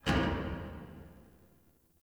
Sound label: Parking Lot Metal Door Distant; Ambience; Warframe; Nineteen Ninety Nine